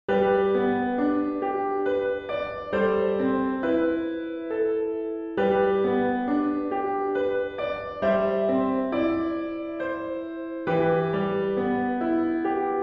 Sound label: Violin Duet; I Need Thee Every Hour; Sweet Hour of Prayer